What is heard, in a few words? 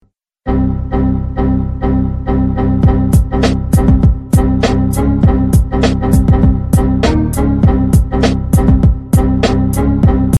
message tone ringtone; win 2012